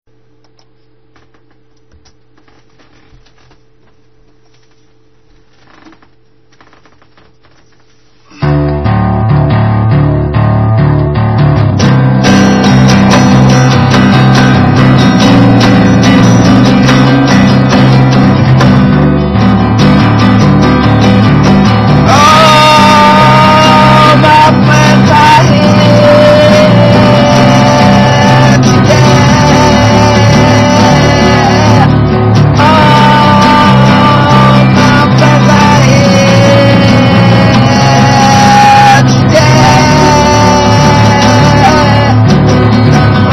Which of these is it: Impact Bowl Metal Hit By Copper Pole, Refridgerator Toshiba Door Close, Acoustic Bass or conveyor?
Acoustic Bass